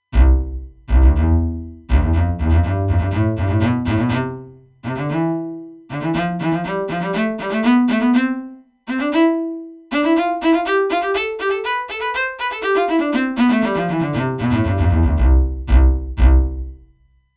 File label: cello staccato test